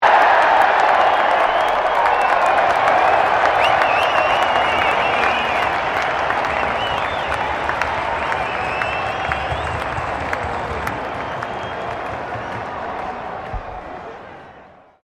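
crowd 20K Melbourne Cricket Ground cheer high, Cricket at Melbourne Cricket Ground; Crowds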